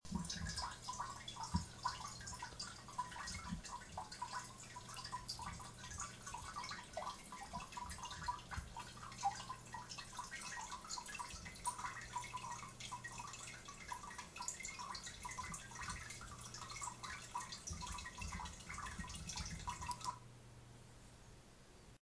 water recorded with iphone

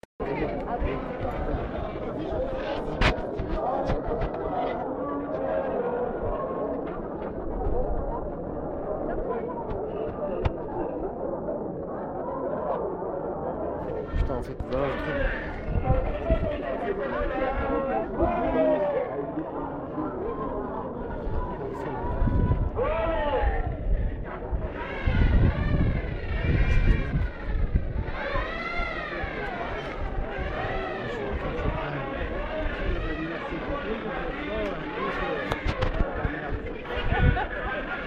ambiance

